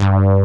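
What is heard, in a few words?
resonant analog bass, JV 1080; Pro-Rec - Synthsonic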